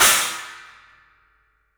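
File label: china, Studio Cymbals; E-MU Producer Series Vol. 5 – 3-D Audio Collection; 3D Percussives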